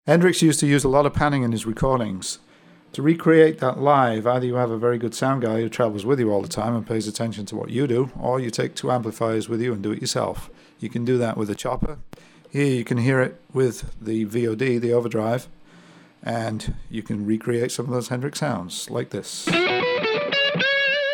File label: Chopper Panning; GFX VOD